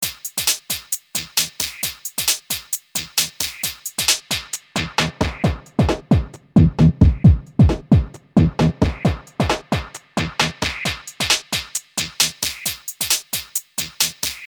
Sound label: EXS Loop 12dB BP Filter